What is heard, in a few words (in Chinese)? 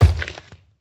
僵尸疣猪兽脚步声